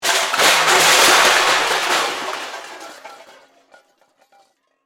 Metal Crash, Impact, Metal, Crash; Crashes (Sound Effects:Objects)

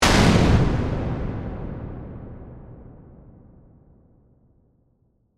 Horror Impact Hit; BlastWave

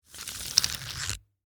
Retractable Reel, Id, Holder, Extend; Hand Tools; BlastWave

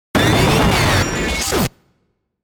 Warble Warp Glitch; Blastwave

